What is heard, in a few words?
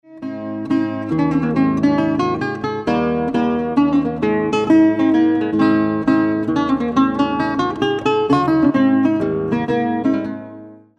Minuet Anglais